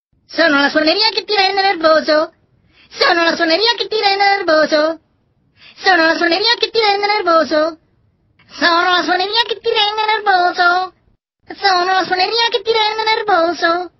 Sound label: Nervous Song